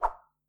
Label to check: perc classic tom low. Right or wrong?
Wrong